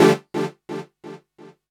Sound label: KEY SHOT TEKNO 140 A; Techno2; Singleshots